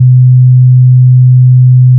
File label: fm voice single; manhattan; FFTimbre